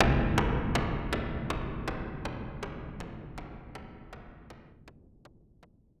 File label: AMBNT 160; Ambient